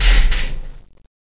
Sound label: X-Ray Off